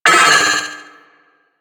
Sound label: Trooper Vocal Pain; Warframe; DE; Sentients